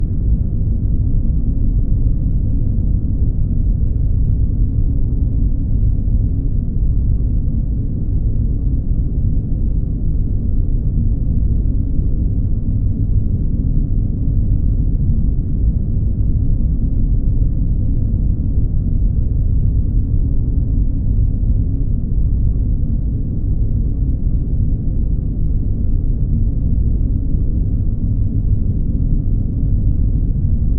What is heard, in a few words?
hallway of fear sub air hollow loop, Industrial Ambience